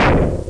foot clunk; Gunlok; Robots

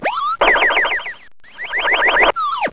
metamorph; DR BRAIN; BUGS

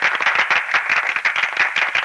success; Crazy Paint: On Location

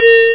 bing

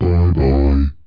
Gorilla; PC Gamer IT CD 41; machines demo

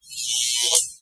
sms

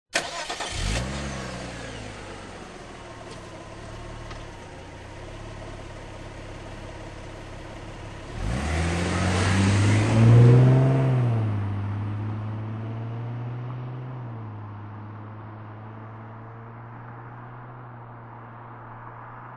fast

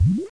bubble; AB3DII

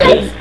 Barrier Hit; Barrack 1.0.0; Barrack Sounds

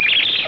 shriek; Star Trek

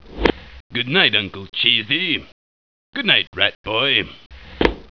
drawer

